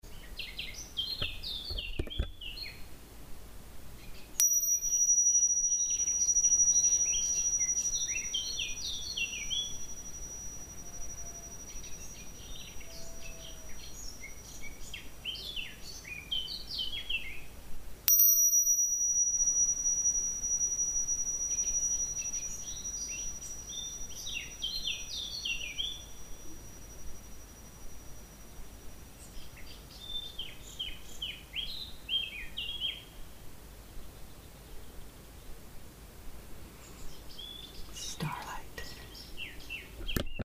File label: bells; Starlight